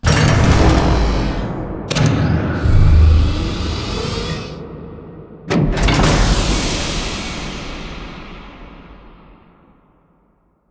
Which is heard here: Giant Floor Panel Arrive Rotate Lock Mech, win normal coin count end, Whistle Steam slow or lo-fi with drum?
Giant Floor Panel Arrive Rotate Lock Mech